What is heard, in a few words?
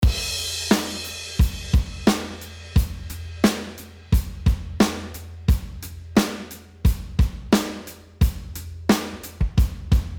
drums tape saturation; waves J37